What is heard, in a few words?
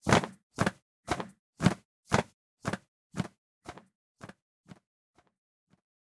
Fly out; Mamepato